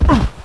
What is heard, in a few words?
hit wall; duke nukem total meltdown